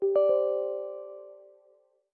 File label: User Account Control; Windows